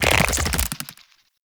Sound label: Infested Big Ashen Melee Start; Warframe